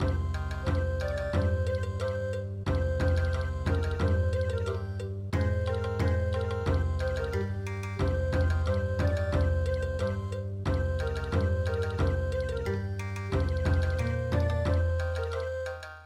kagome kagome bon

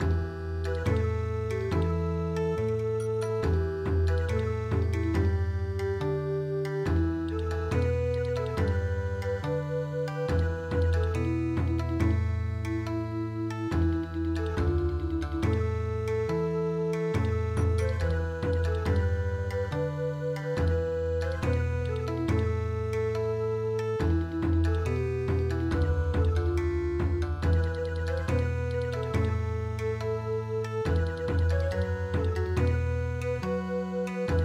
Bon Odori, midi

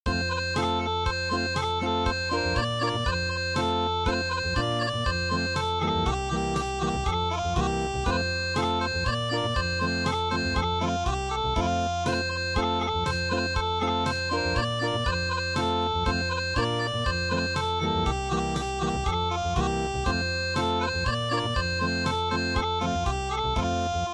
Acoustic, traditional

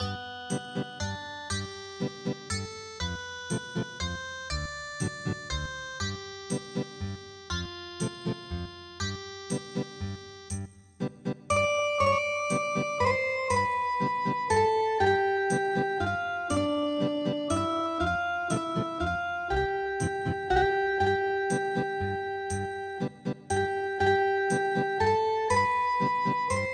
Ethnic Guitar, traditional; The Banks Of Claudy

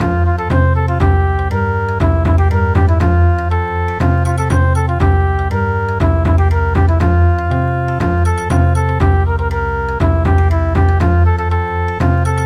Bon Odori, traditional; Samba lele

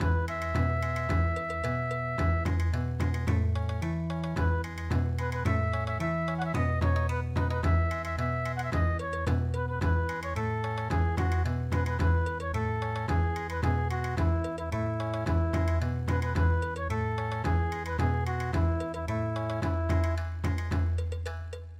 Bon Odori

